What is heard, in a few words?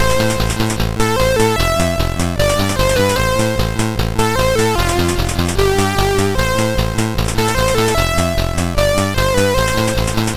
sid MOS6581R3; NWCUG Demo